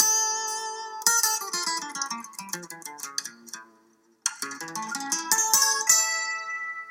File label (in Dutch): Muziekfragment 90S